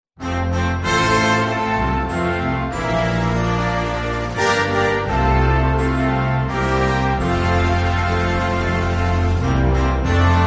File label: Happy Birthday, Concert Band/Orchestra; Score and Parts